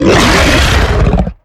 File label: Stealth Attk Grapple; Evolve; Behemoth